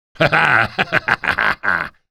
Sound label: mad laugh; vo; ravenholm